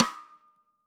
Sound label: Rim; Drums; Ableton Live 12 Lite Core Library